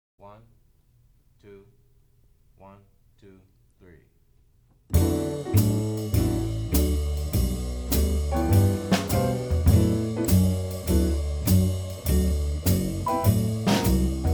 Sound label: D Blues Guitar Bass Drums; jazz